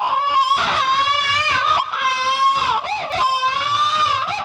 baby

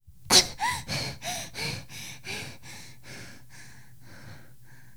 kokyuu; 女; 息 ５ （危機一髪）; 窒息状態からの？

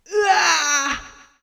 uaa

